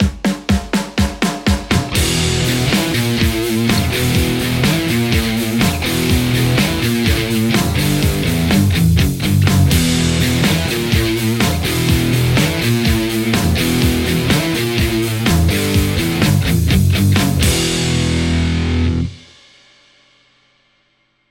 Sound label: Les Paul JCM800 hot; mercuriall